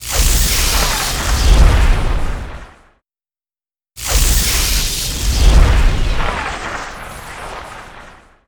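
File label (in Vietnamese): Âm thanh sốc điện phòng điện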